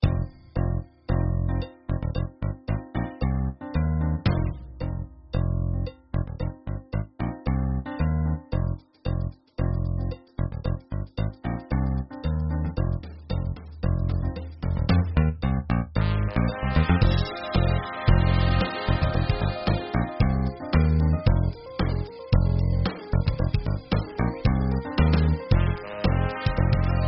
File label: bgm